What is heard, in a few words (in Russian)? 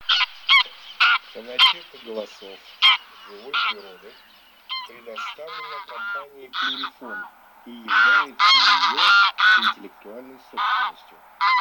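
Гусь серый базар Oche Selvatiche, манок; plurifon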